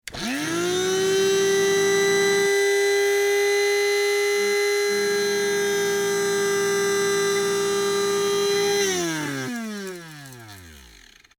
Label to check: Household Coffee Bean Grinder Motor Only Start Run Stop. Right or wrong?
Right